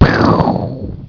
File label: BOOM